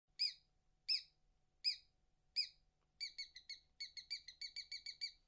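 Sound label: small mouse squeak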